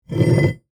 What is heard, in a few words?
Metal Weight Slide, Foley, Metal, Weight, Slide, On, Metal, Drag, Short; Weightlifting